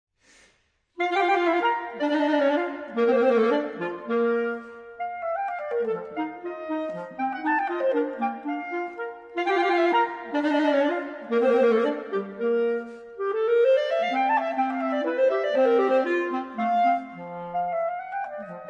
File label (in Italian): duetto concertato